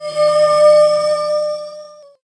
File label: cast fire; Magic Stones